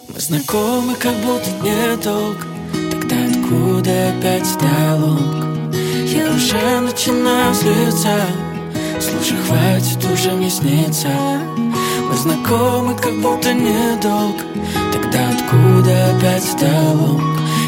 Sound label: acoustic